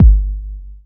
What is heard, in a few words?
DR. LOOP, DRUMS; X-Static Goldmine 3